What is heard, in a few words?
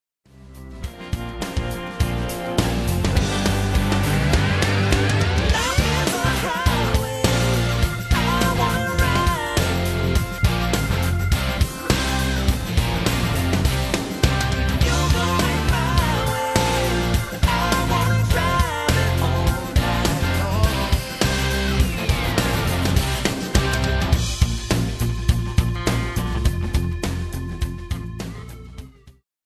karaoke; disney